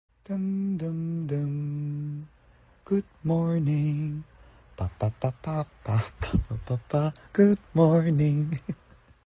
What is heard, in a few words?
alarm; han jisung